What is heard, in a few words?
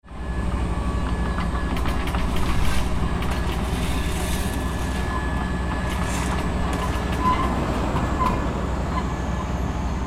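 decon, Fukushima Soundscape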